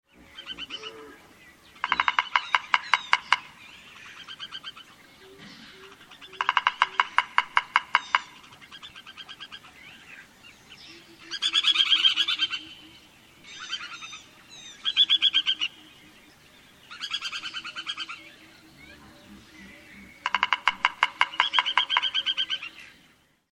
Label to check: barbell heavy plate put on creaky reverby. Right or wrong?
Wrong